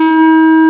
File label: material bell; cadaver; Amiga Soundtracker Sample Packs